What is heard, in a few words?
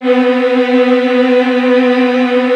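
Virtuoso Strings, violin trills, B1; ILIO; Kirk Hunter Virtuoso Strings VOL-1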